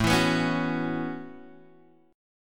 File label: A 6th Add 9th, Guitar, Open G